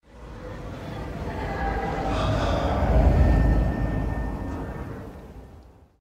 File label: snap; infinity-craft